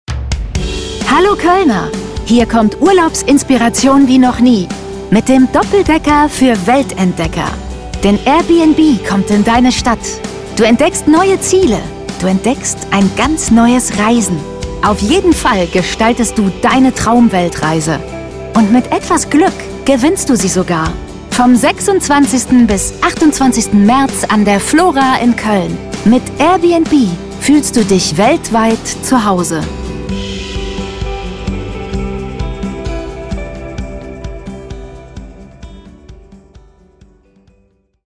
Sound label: Guitar Pop